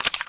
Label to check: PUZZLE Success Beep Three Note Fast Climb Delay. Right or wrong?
Wrong